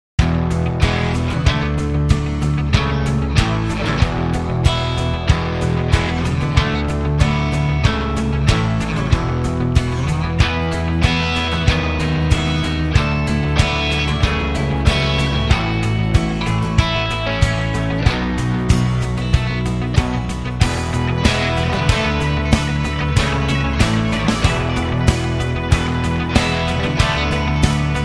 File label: bg music; Football! LuckyTap; Eyecon